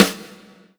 Roland SC 88 Snare Drum

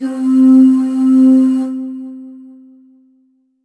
Roland JV-2080 Vocal Oohz C4

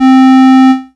Yamaha PS-3 Clarinet C4

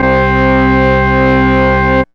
Ensoniq ESQ 1 Rock Organ C4

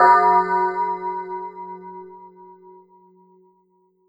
Yamaha RM50 Clock Strike

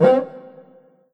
Roland SC-88 Open Cuica

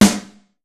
Yamaha RM50 Snare Drum

